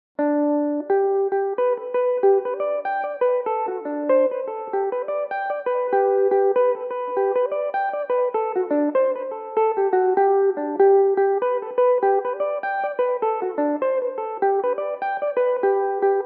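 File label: G major no repeat, irish mandolin, tunes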